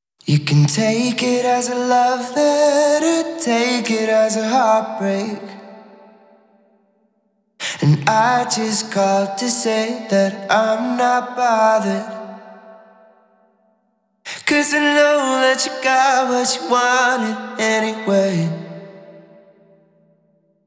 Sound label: Master Room XL-515 Male Vocal